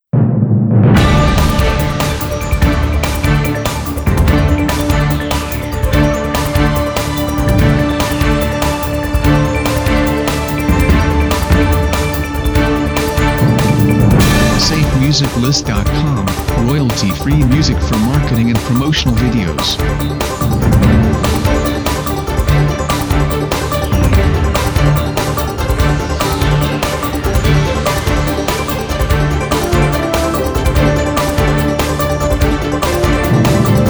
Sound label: News Broadcast